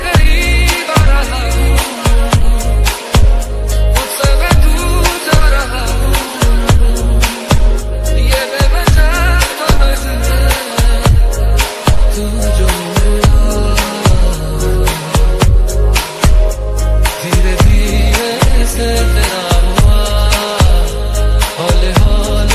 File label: bollywood ringtone